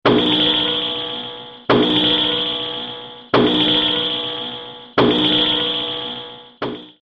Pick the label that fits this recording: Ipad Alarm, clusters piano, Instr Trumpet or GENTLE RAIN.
Ipad Alarm